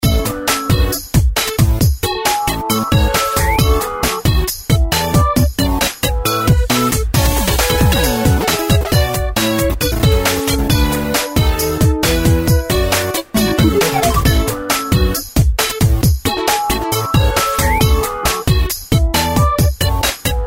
toy box

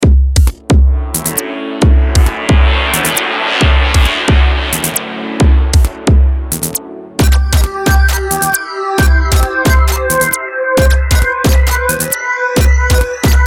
electronica

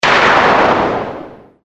wepon

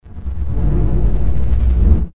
noise

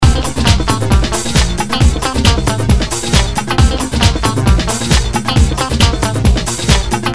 jingle

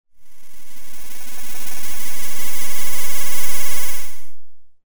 warp

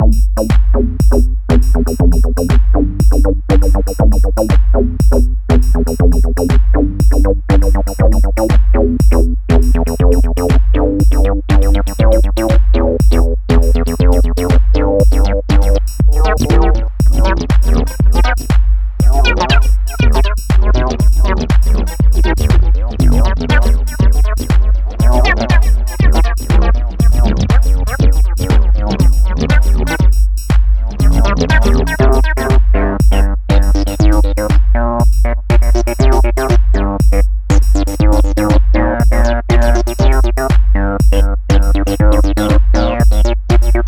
tb3 acid

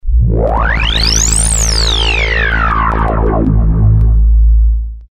pad

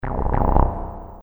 error

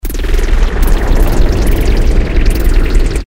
control room

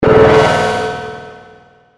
magic

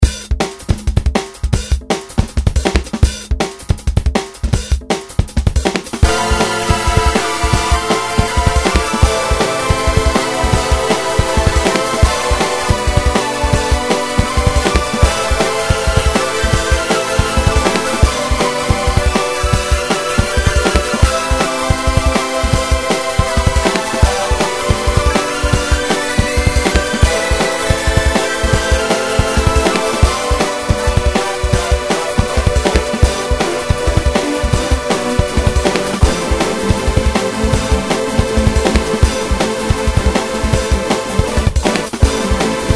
synth

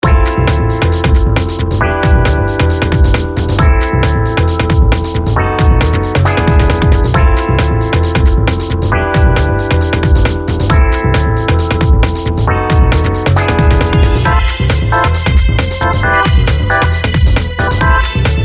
2step

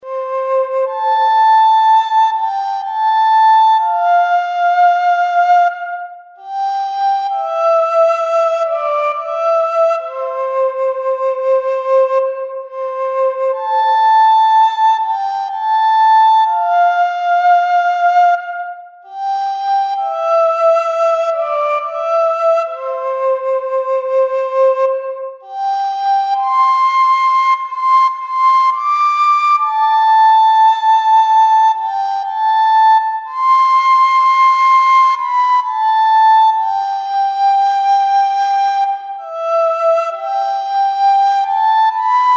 panflt maj

